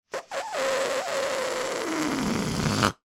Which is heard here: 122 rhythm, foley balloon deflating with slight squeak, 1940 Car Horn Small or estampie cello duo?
foley balloon deflating with slight squeak